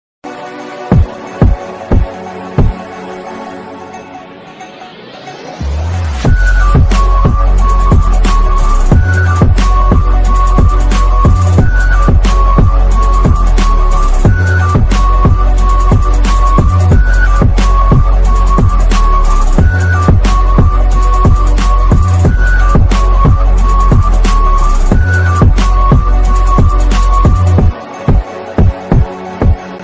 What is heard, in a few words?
new english ringtone bass boosted ringtone bass remix